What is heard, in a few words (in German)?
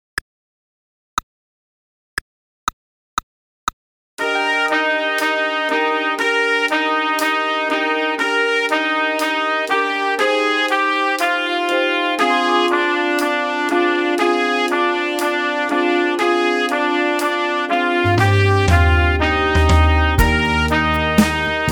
Melodie, trumpet; trumpet fun vol 1